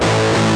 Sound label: guitar chords